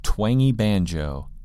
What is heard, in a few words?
twangy banjo